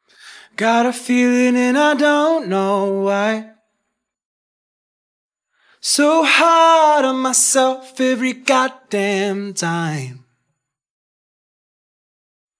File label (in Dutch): Alleen zang vokaal